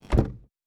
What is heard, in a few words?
Door Close; Survival Game Sounds - Lite Edition; Doors